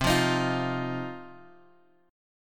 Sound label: C Minor Double Flat 5th, chord; Cmbb5; {X 3 3 X 4 1} strummed